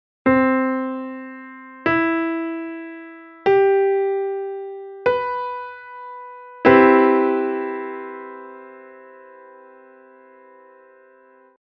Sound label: cmaj7, sax